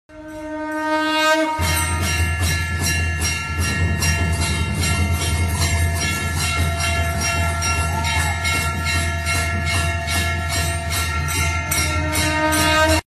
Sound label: Lakshmi Mantra